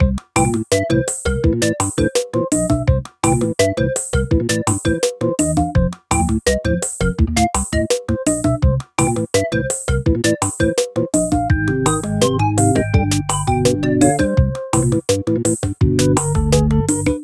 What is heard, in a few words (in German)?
MUSIK; MOBICLIC 84; CPLUSCLAIR